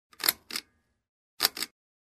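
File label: button press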